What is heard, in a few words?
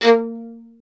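STR violin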